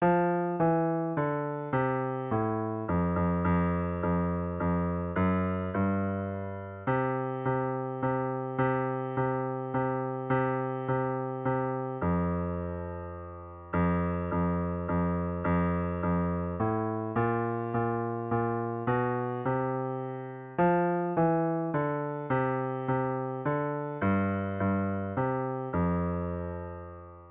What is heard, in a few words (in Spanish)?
Bajo